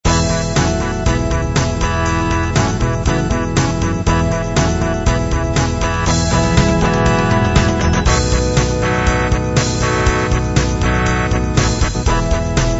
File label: pop rock